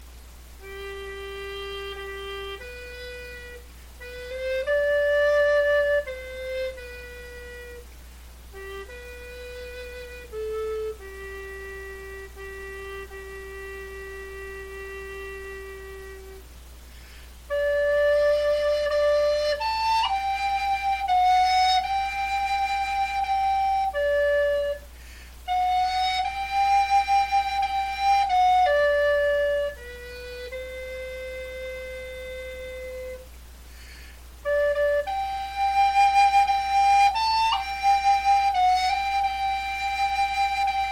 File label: lullaby alto recorder; gartan mother s